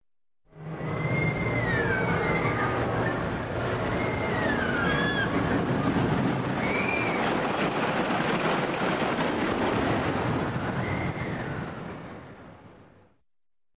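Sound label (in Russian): звук на американских горках проезд тележек крики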